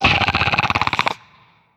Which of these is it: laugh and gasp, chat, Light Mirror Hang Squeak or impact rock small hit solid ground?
chat